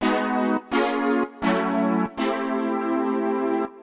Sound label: Synth Loop (125BPM), retro house